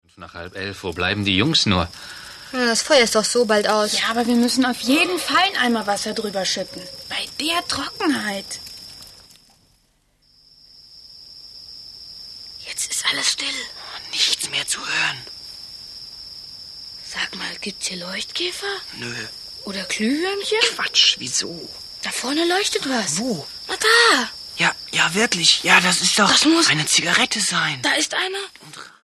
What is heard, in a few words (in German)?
Schreie